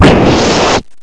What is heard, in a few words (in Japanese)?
bowa; みんなでバトル